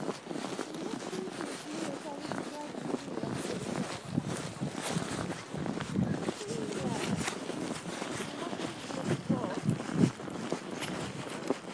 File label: london fields snow crunch